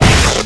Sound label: Cow Attack; CornuCow